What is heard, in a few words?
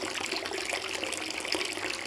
water trick; Soldier Of Fortune II Double Helix; XBOX; ambience